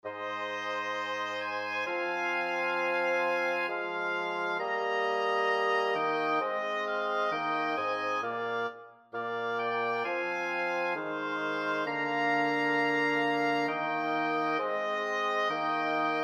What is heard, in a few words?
flexible mixed ensemble, 4 players